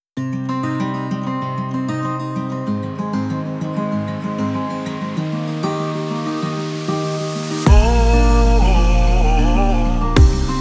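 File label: ispanyol latin reggaeton karnaval; By Burak Sound Bank; latin ispanyol tema vol 2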